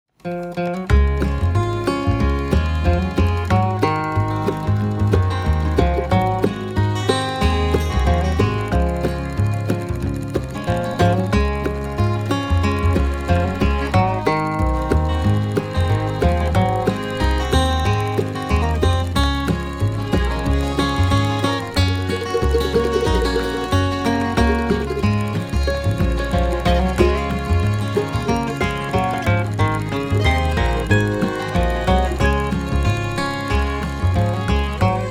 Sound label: guitar